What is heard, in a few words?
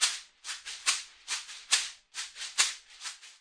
070 bpm shaker pattern